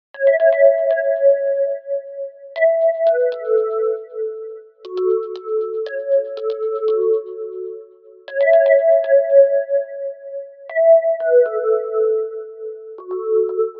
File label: key lead 118 bpm; essential pop key lead